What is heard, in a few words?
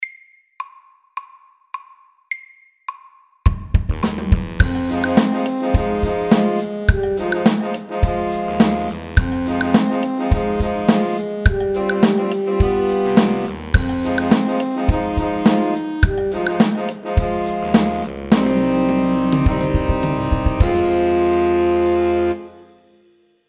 Funky drums FAST 105